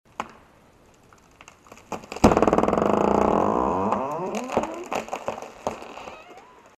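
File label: derevo treshit i skripit, tree cracks and creaks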